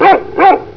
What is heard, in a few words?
dog